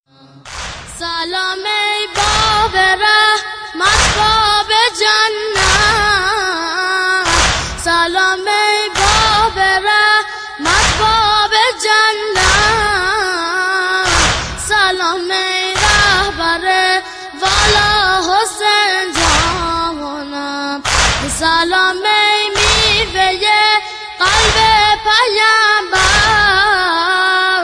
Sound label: Irani Nauha